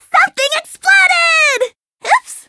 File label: menu tap; cherry